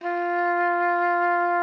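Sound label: F4, oboe d'amore; Xsample VOL-7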